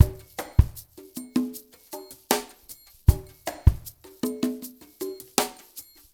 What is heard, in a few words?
ICE DRY MIX, Liquid Grooves; Spectrasonics; Liquid Grooves 1 & 2